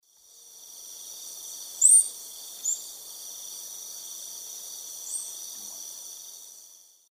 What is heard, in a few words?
Picumnus lafresnayi, landing call; S. America; Eastern Lowlands